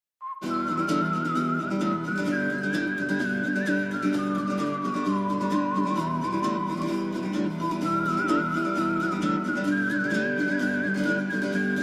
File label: Whistles in the Wind; MRSOH